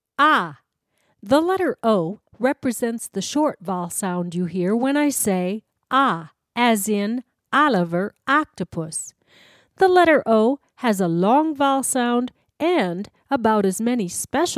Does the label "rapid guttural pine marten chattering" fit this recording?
No